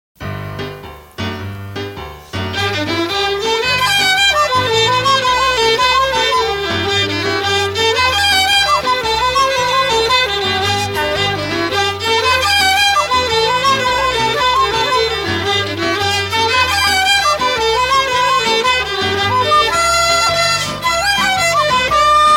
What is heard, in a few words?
cowboy's jig (instrumental)